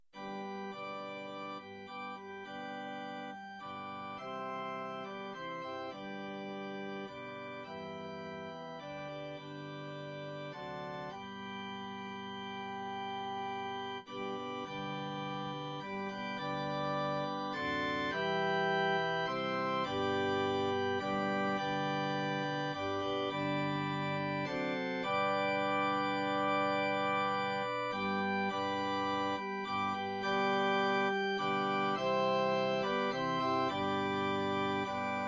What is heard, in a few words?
Amazing Grace Organ